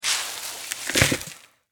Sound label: Foley Sand Pail Dump Bucket Upside Down; Playgrounds